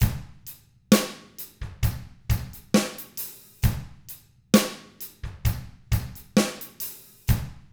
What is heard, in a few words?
SLOW ROCK, Drums; ILIO; Double Platinum Drums 1